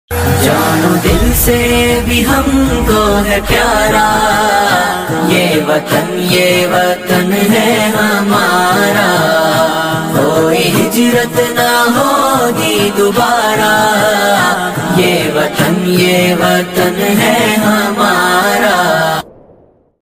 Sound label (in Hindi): desh bhakti ringtone; 15 august special